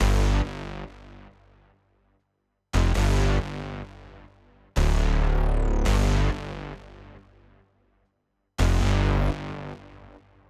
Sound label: bass A, Smooth RnB; Big Fish Audio; Legendary; Shes Mine